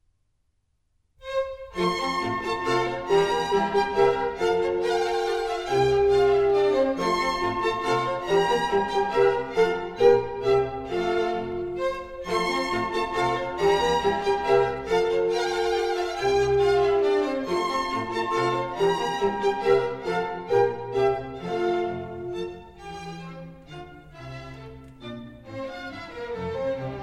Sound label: Minuets, F Major; Mozart; Dances and Marches